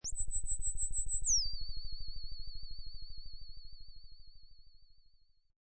Ufo Contact, Burst of an UFO trying to make contact, very high sound, pulsating.; Also sound of a strange alien machine